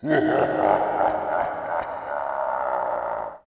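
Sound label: tiki laugh; hawaii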